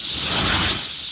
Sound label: mage run